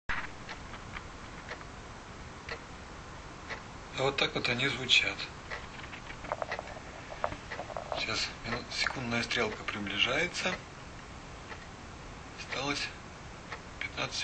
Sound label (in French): coucou